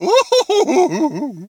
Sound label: Zombie Laugh